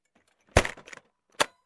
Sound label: Suitcase opening